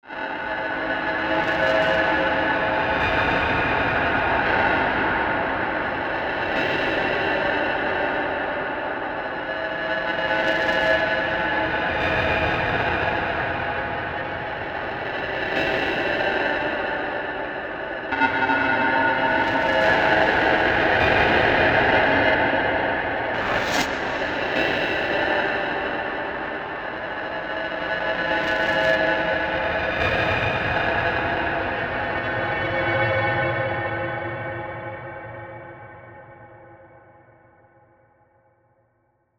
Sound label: drone